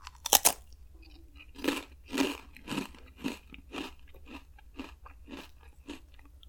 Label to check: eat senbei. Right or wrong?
Right